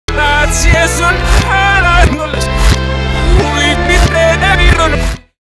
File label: reverse, pop rock; indietro; backmasking contrario